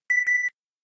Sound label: Video Stop, ui; Kyocera; DuraForce-ATT